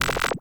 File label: Corrupted Notes; Warframe; Bard Quest; Bard Boss Fight Notes